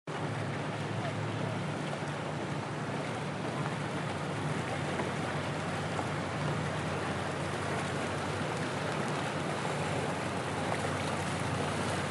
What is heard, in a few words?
Soundscape Summer Trip Lyon; Tibbalds